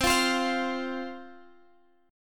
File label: F 5th C, chord